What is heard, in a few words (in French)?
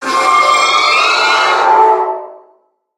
Cri Méga